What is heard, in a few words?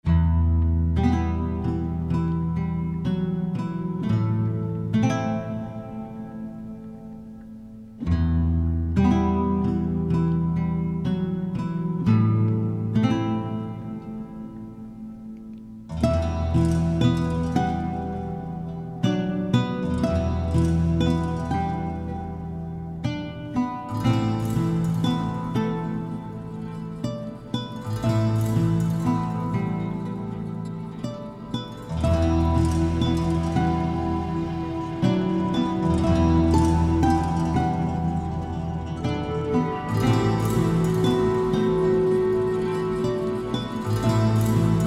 Ambient Forest Night Camp; Shadow Fight 3 (Original Game Soundtracks, Vol. 4) (2024)